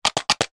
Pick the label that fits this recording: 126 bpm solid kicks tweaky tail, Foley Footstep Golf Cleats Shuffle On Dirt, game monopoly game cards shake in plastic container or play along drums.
game monopoly game cards shake in plastic container